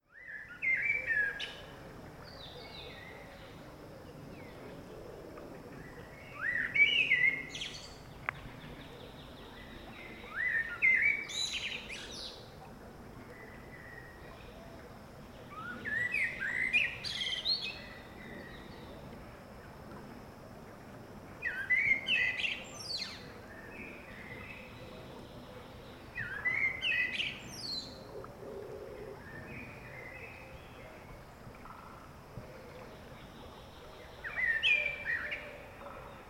Turdus merula; SETE

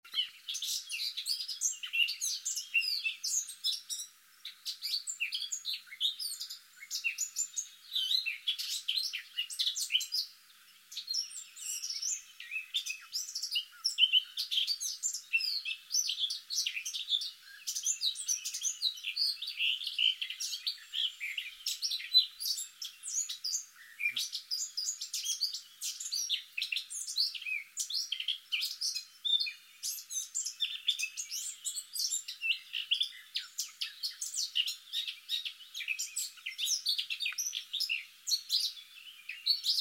Sylvia atricapilla; SETE

